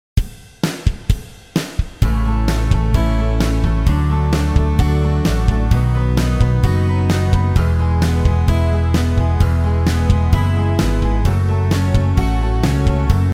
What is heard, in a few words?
strings